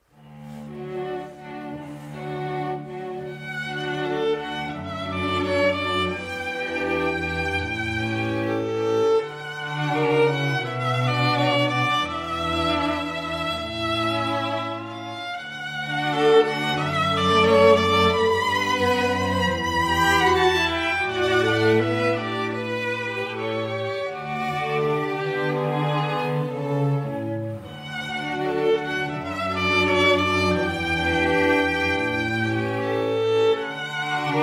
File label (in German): streichquartett salut d amour